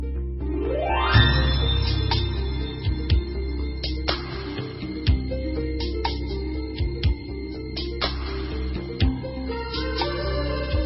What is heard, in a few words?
slow music